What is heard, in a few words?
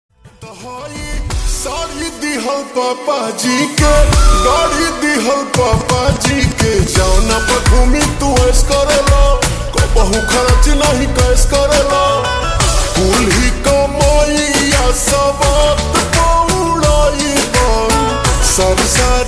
Slowed Reverb Ringtone